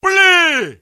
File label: fire